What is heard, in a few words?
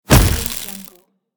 electric bash